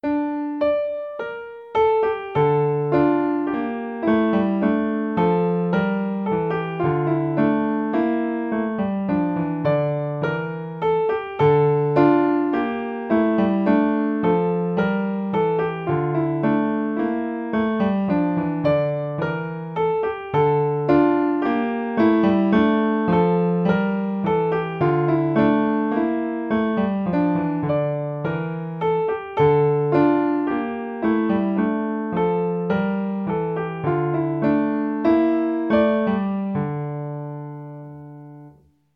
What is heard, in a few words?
Canon in D minor, piano